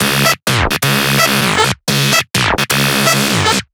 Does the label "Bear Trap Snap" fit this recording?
No